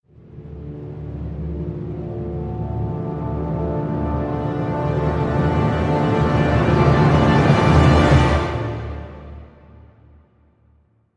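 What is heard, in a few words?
Crescendo Grand Finale Minor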